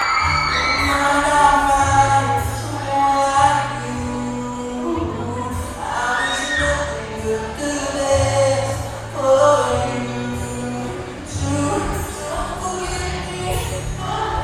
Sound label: singing